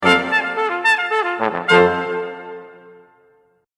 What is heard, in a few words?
Waltz; Brahms